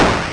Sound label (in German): BOMBE; speedy